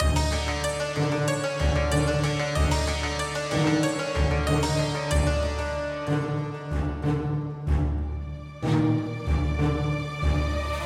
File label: Puzzle Loop; The Vale; prince of persia 2008 gamerip